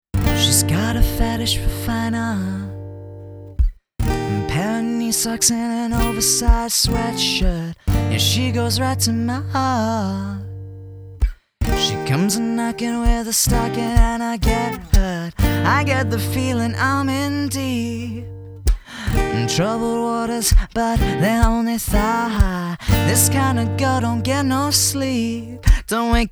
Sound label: Acoustic (verse and chorus)